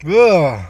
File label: disgust